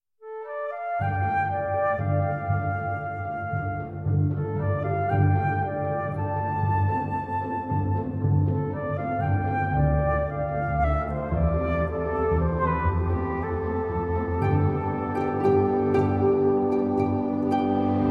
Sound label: Sunrise of flutes